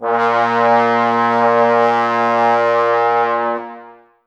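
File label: Brass trombones A#; Miroslav Vitous - Woodwind Brass Ensemble; Tbs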